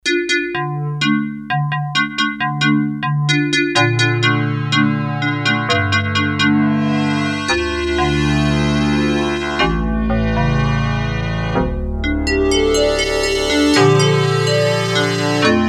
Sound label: Mallet Pad, Korg DS-8; Factory Patches